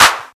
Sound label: short clap one shot d sharp key, Royality free hand clap tuned to the D# note; single hits one shots